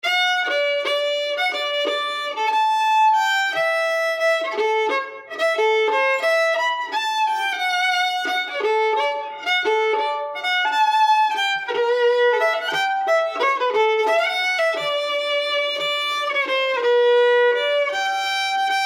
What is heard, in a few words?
Swing Waltz, Fiddlers; Ernie Wells